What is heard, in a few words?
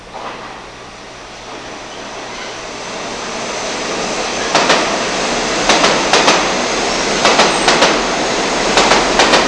subway, transportation; Web Clip Empire 150,000